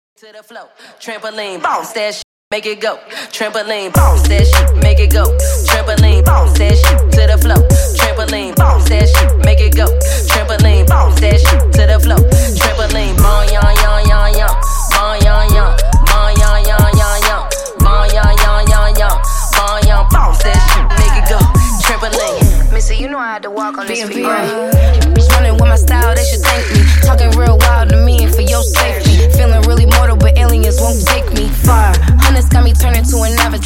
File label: Acapella Dj In Out 105 Bpm